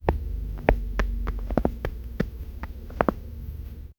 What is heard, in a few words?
soft gentle paw steps of, cats